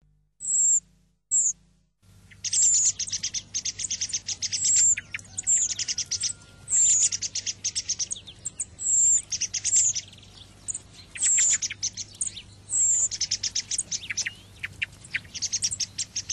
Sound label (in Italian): Tordo